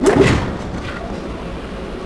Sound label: elevator exterior start; doors elevators; Star Wars Obi-Wan; XBOX